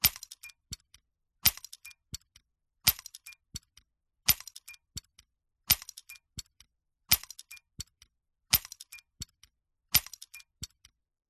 science fiction steampunk gears turn trigger; zapsplat

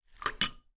spoon put down in bowl of cereal

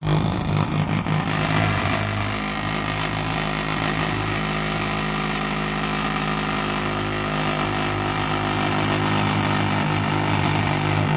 metal vibrate vibrating object on metal surface; foley; zapsplat

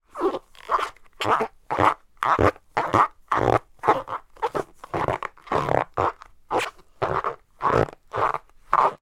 foley movements rubber pants clothing squeaky

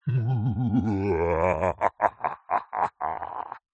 human laugh slightly evil

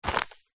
seeds packet black chia pick up

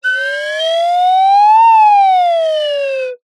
cartoon slide whistle up and down

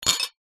wine glasses x3 pick up one handed clink; household

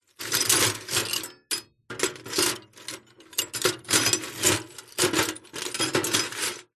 glass pieces broken rummage in metal container; zapsplat

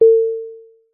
vehicles aircraft call bell single tone; zapsplat

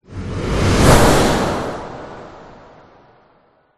whoosh; orlando lanzini

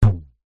warfare bow release pluck

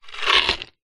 horror creature single bite crunch large

